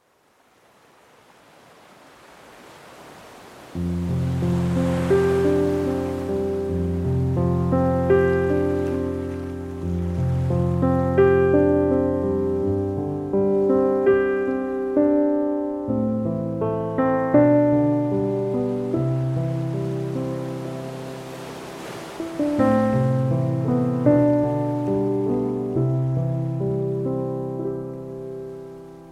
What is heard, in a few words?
- Peaceful Study Ocean Waves